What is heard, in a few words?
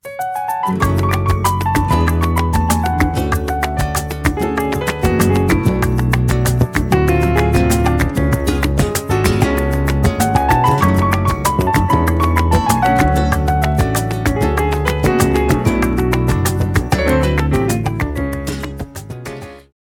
salsa piano; ringtones; latino